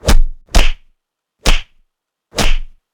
Cinematic Punches

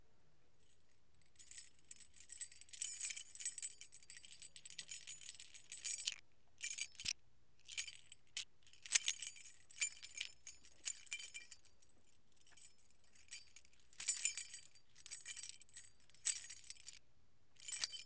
Keys

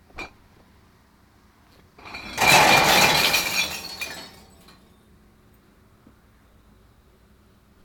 Glass Dump

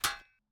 Metal Hit Clang

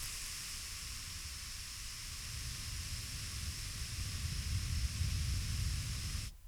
Spray

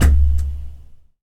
Bonk

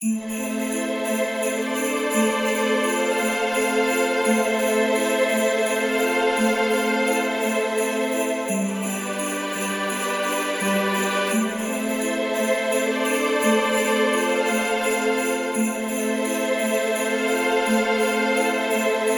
Xmas Bellis

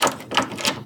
Door Handle Jiggle

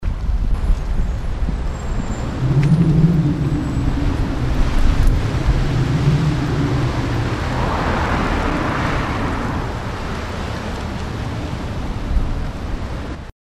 Howling Wind On Street

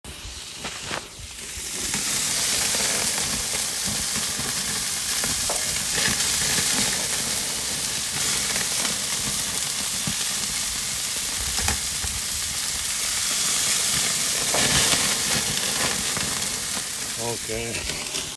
Sizzling Steaks On A Gas Grill

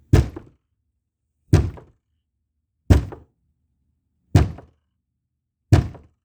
Hard Door Banging

